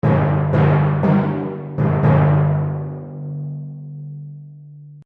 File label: War Drums Stinger; Stinger Sound Effects